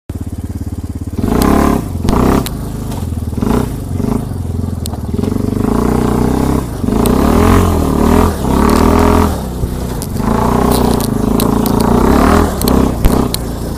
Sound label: suzuki eiger